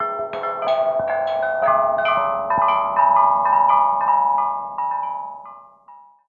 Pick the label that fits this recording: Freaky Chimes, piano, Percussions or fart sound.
Freaky Chimes